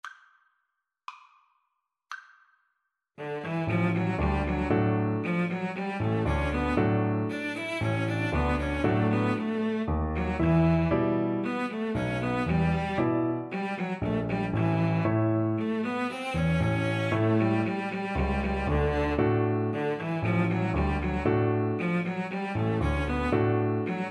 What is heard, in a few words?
Cello Duet